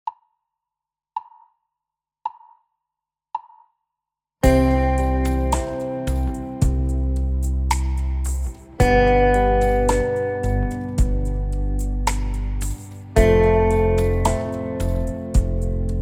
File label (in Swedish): Helnoter